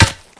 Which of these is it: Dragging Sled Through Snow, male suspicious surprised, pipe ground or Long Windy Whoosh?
pipe ground